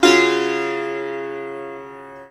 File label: SITAR CHORD; SITAR CHORDS